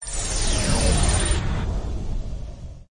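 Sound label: ring scroll; casino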